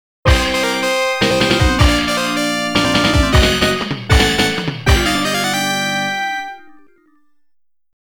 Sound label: Stage Starting; Rockman Sound Box 2